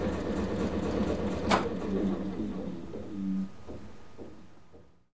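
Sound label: Compressor End, Train; OpenBVE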